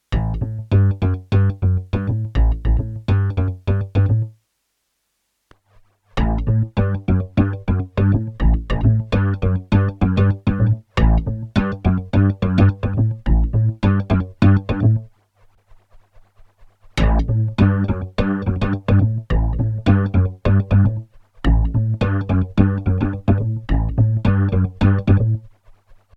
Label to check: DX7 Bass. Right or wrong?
Right